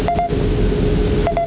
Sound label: Missile Movement; Kongbots 1.0; Robots; Strategy